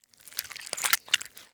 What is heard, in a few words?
make zombie; plague